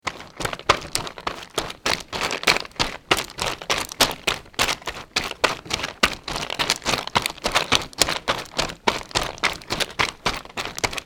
Gravel Running, Footsteps; Todd Blankenship

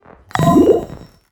interface, spaceship; Bluezone Corporation

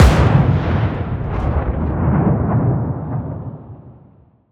explosion outdoors; Bluezone Corporation

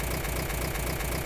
Generator Work; simpra

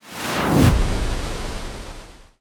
transition, trailer; Bluezone Corporation

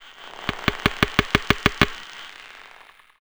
biohazard creature organic texture; Bluezone Corporation

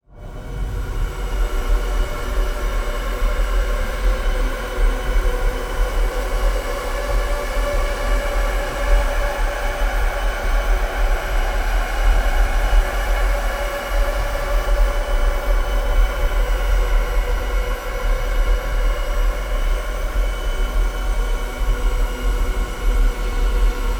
movie ambience, scary; Bluezone Corporation

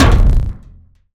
soundwave robot metal texture; Bluezone Corporation; Transformers

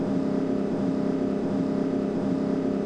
engine drive; simpra

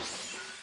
car crush, car; simpra

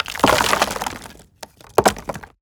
forest wood log debris falling; Bluezone Corporation; Nature

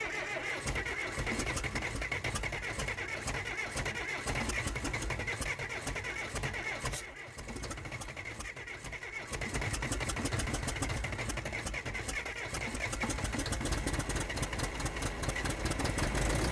Start Try, engine; simpra

